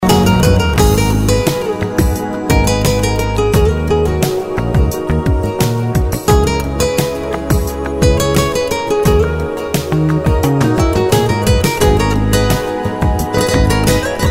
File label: Melody, RingTune